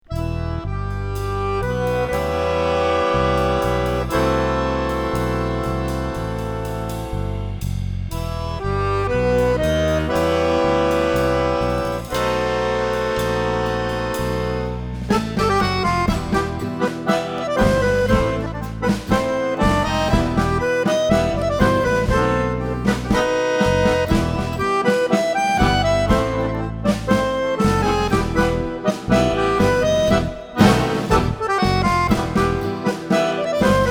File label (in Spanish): Tango de Zapatos Rojos Akkordeon